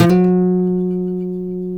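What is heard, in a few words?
G 3A MARTIN SLIDE, Guitars; MARTIN 6; Spectrasonics; Hans Zimmer Guitars VOL-1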